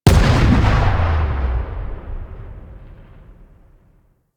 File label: Space Mine Explosion; Hazards; Warframe; Railjack